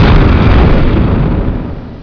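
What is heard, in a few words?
EXPLODE, Explosions; sound effect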